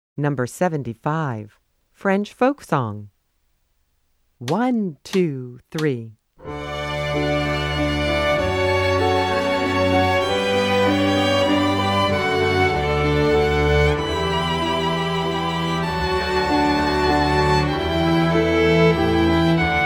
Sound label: French Folk Song